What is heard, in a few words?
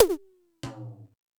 More Toms, Drum Machines